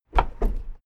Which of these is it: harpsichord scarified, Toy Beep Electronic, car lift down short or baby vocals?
car lift down short